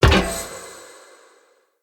Chest Ode Common Open

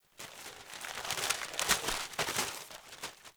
Paper Blowing; Warframe; Ambience; Grineer; Carnival

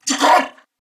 Surprised Corpus Venus Male, en; Dialog; Barks; Battle; Warframe; CorpusVenusMaleB

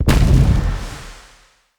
Casting Device Projectile Destroy Heavy; Weapons; Player

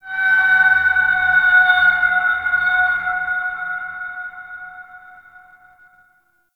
Metal Bend; Ambience; Warframe; TheNewWar; Zariman

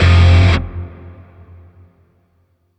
Shawzin Hirajoshi Chord; Warframe; Grineer Shawzin

